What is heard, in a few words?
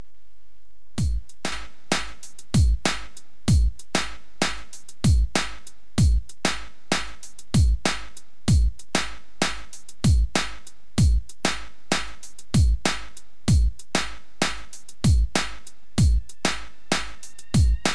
drum